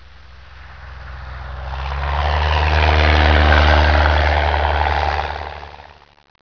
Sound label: plane